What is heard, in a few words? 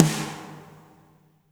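reverb snare right; Spectrasonics; Burning Grooves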